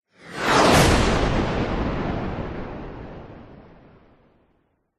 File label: vehicles fighter jet pass by zoom past very fast designed